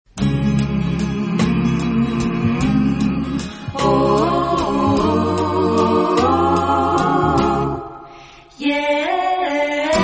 instrumental theme; australian otr promos and idents

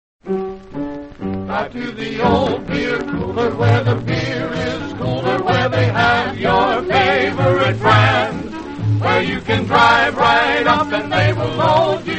radio jingles; the beer cooler; allied radio artists

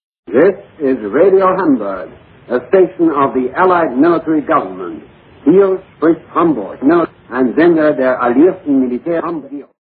radio interval signal; radio hamburg

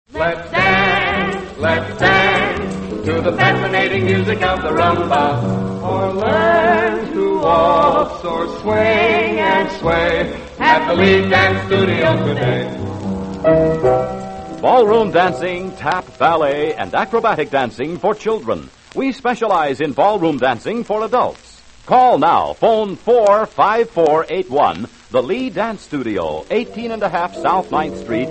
radio jingles; lee dance studio; allied radio artists

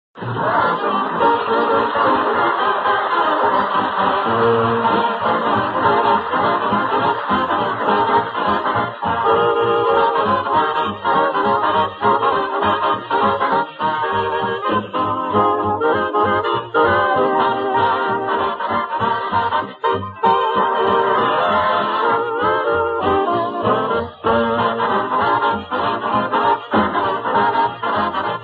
orchestra

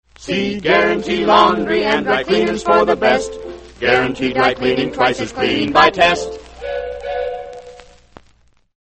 radio jingles; guarantee laundry; allied radio artists

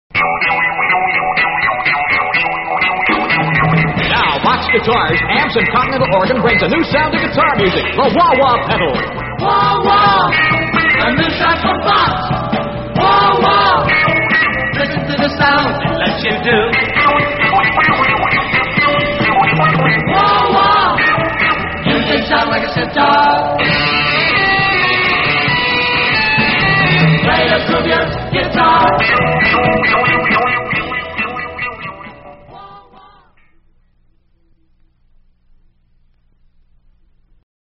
vox wah wah; psychedelic promos and radio spots

